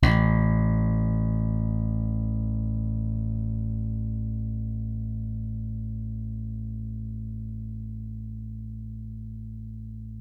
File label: pull